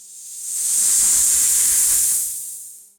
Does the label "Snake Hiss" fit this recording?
Yes